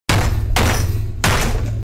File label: smash; xqc